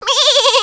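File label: baby sheep, animals; Wired for Sound Pro CD; Aristosoft, Inc.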